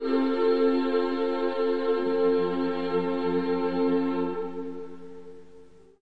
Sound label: Slavic Choir F Mmm